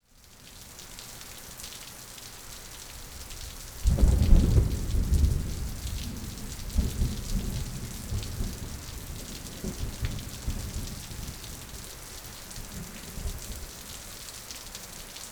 Weather Thunderstorm

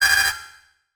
Multimedia Internet CD-Rom Flash Beep Multiple

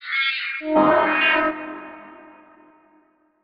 Transition Hit Impact Electronic; Production Element

